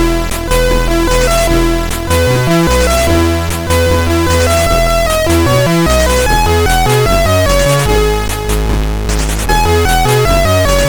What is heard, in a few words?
Old Favourites, sid CSG8580R5